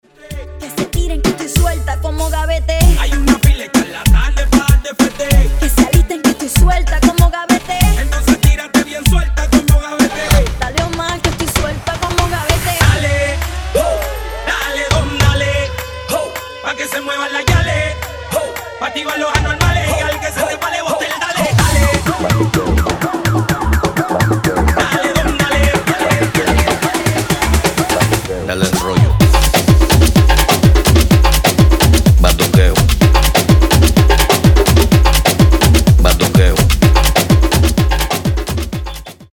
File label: Reggaeton To House Afro